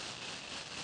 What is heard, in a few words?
slide; Temple Run